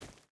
footstep barefoot forest run; Rust

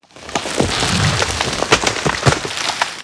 treefall creak; World of Tanks Blitz

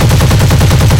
mk108 real loop right, guns

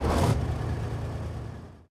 engines; World of Tanks

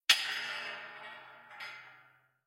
shells 20mm hull; guns; tanks; War Thunder

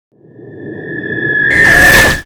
shellshock exit

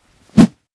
rock attack; Rust

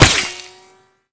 impact dirt; weapons; Modern Warfare

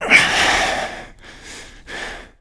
player climb efforts; Call of Duty Modern Warfare

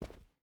footstep rubber boots cloth run; Rust

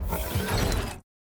ship engines; World Of Warships

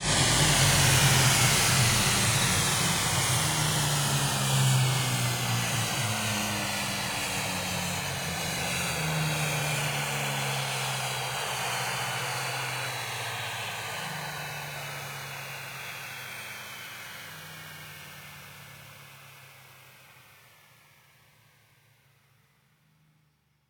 Aircrafts MiG-15bis VK1 End; DCS

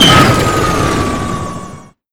heli shutdown; Modern Warfare

